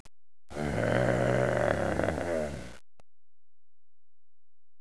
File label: grrr